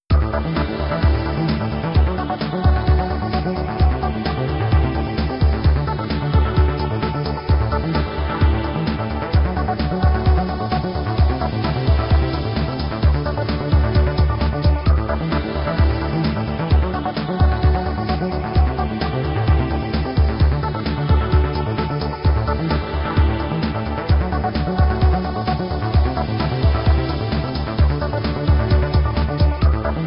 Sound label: Goals Complete; Buildalot